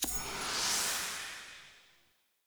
Skill Tree Purchase Hold

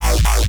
Alt Fire Growl, Infested, Arm Cannon; weapons; Warframe; Coda Bubonico

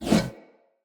One Handed Axe Whoosh

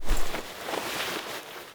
Shrine Foley Knee; Warframe; Duviri Murmur; Rusalka Shrine

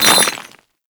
Melee Impact Cement

